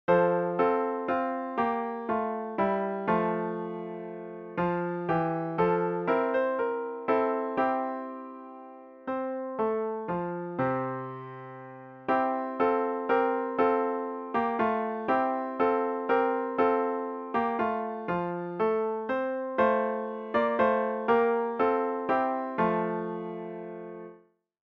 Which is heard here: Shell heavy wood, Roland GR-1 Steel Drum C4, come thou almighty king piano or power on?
come thou almighty king piano